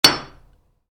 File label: Hammer stone, Hammer: Impacts Stone; Tools; Stuart Duffield